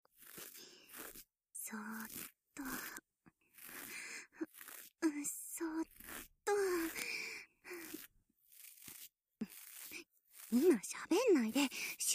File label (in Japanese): 左耳耳かき（立体版）, ASMR